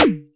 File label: quick Snare; Futura; Ableton Foundation FreePacks; Wicked Kits